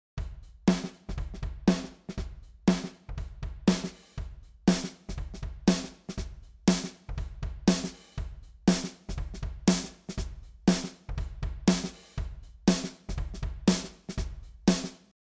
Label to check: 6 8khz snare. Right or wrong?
Right